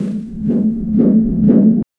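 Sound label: heavy footsteps in a corridor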